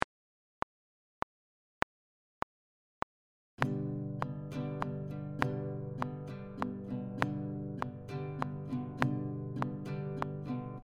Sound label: Easy strumming pattern, guitar, 6/8 time signature